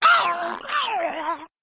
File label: murloc death; silverhand murloc